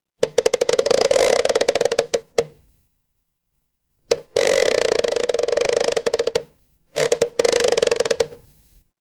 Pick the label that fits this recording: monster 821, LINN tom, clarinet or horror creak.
horror creak